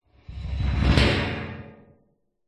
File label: metal bars object run across rattle clunk